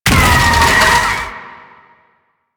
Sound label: bike damage; Rusalka; Warframe; NineteenNinetyNine; Stage8ShitboxConvoy